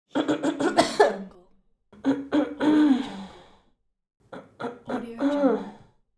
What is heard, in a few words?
clearing throat